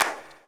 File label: Claps; Vengeance Samples